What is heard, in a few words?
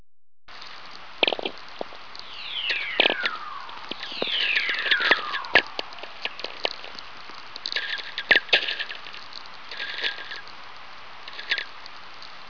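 whistler, VLF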